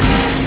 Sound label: close door